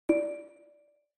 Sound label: OS Sound Notification